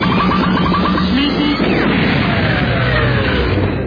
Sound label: roadrunner beep, roadrunner horn beep beep